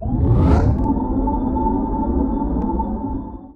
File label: telekinesis target; dota; rubick